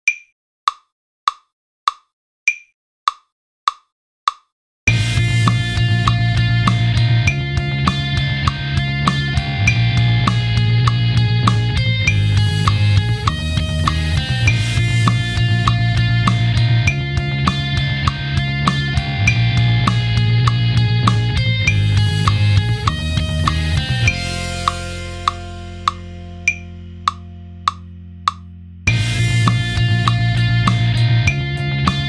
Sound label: More 4 bar Phrases @100 G